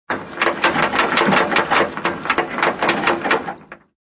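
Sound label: anchor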